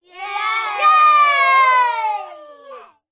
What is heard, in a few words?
human children x5 under 10 english cheer